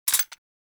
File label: Emplacement Mech; Grineer; Weapons